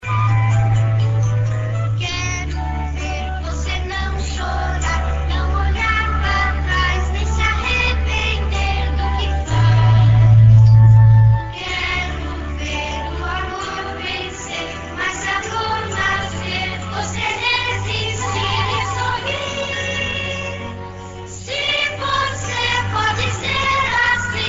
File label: Jingle, midi; Banco Nacional Anos 70